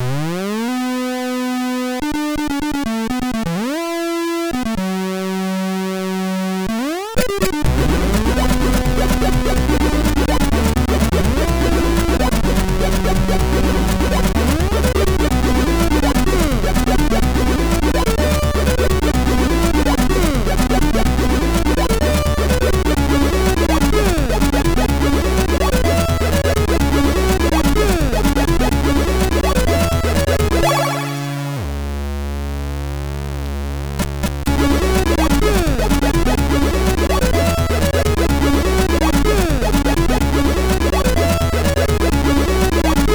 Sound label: MOS6581R3